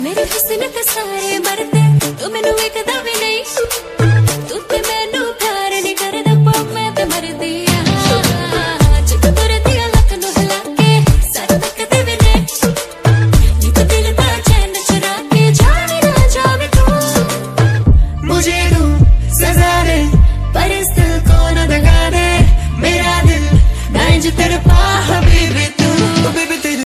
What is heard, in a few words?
hindi music ringtone, bollywood